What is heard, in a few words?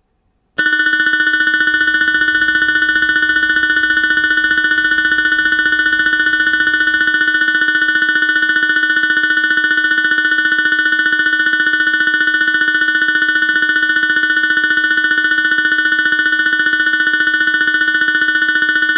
koto